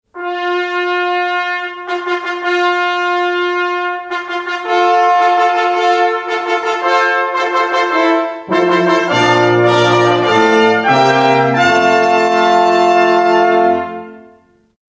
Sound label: fanfare